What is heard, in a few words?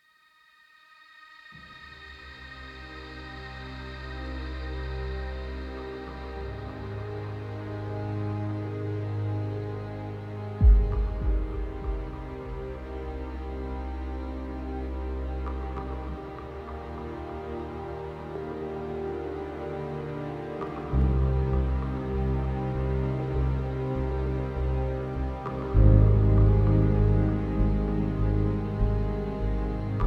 starwaves; oblivion